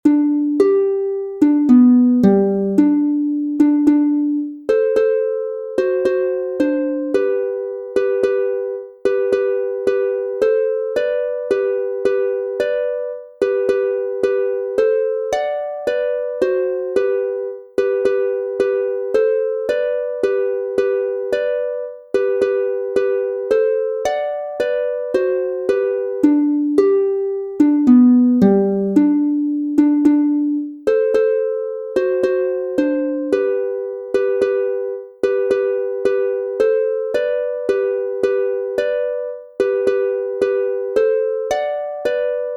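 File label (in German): G Dur 2 stimmig instrumental, Harfe; Jetzt ist die Zeit und Stunde da aus d. Bayer. Wald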